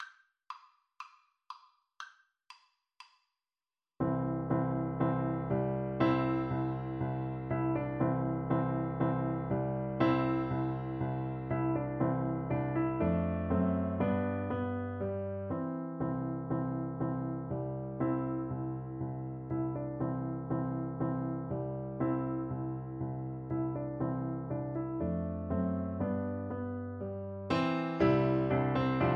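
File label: vivaldi spring beginner piano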